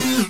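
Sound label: Rave Synth, Dance Mega Jungle Rave; Best Service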